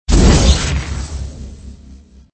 flame up; jungle jiggy

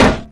IMPACT; RallyC

